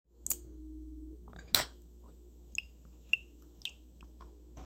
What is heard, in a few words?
tea sip sip sound ambien; food and drink